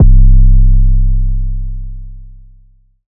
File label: 808 (C); Likes; Cymatics; GHOST (Beta Pack)